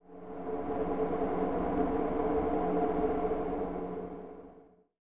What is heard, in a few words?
magic dire, ambient soundscapes; dota